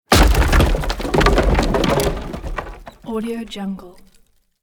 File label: destruction wood impact medium